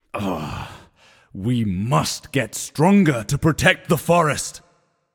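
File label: non verbal boohoo, voice; cernunnos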